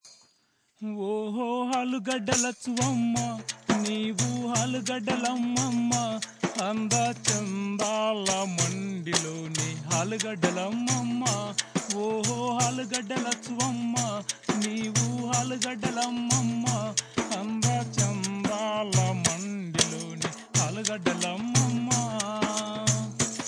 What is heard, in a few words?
Solo